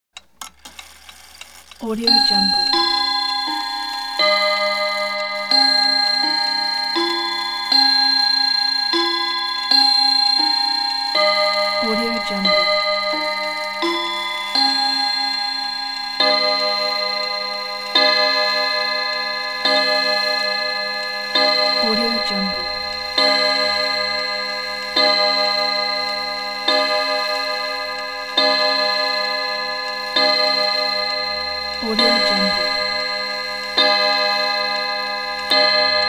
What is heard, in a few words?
mantle clock strikes and chimes; hollywoodedge